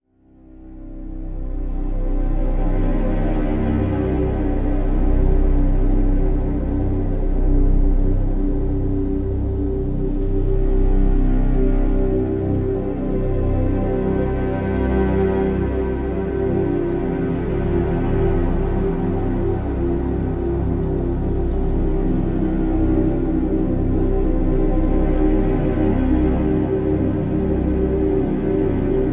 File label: gothic ambience 432hz; background music at 432Hz; Radcliffe ~ Walpole ~ Bronte ~ Barett ~